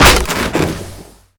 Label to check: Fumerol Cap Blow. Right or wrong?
Right